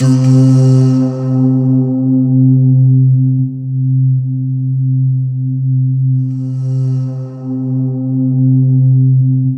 C4, Synth Leads

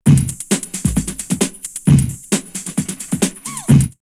drumloops, Techno House, 132; X-Static Goldmine; 90 s Sample CDs

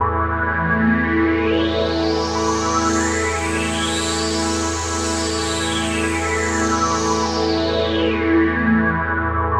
100 A Drone Enlighten; Zero-G - Future Wave; Drones

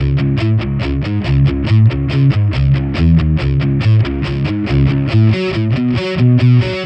Distorted Guitar 140BPM C#min; Distorted Guitars; Vengeance - Essential Clubsounds Vol.4; Guitarloops

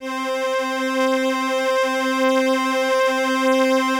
LAMBDA, String Machines

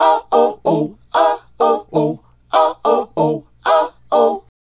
Male Group, Hip-Hop and RnB Vocals

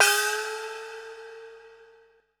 GONG OPRA, TIBET; Best Service Advanced Media Trax Modern Composer; ETH TIBET

